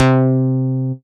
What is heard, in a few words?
C Synth, One Shots